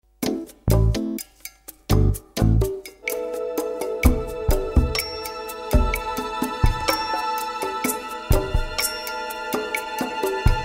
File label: SOFT SALSA